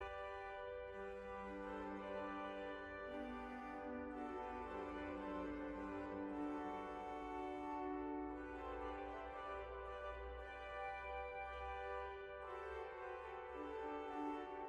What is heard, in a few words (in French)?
JINGLE A TOI LA GLOIRE